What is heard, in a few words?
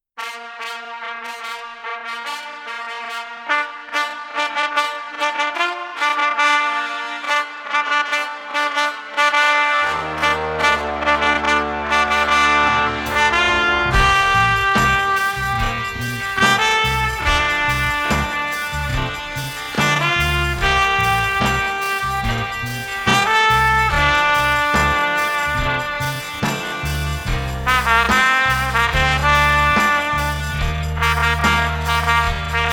slow